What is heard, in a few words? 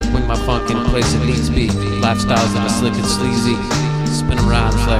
Drum Machine